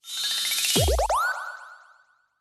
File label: multiplier grow; Fantasma; riviera Star; super star multiplier grow x4